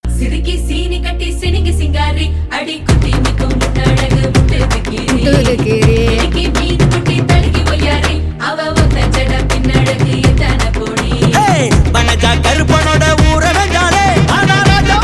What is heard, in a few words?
female; tamil; kaappaan